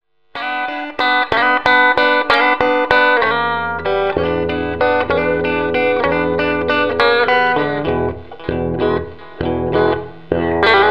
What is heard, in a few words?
riff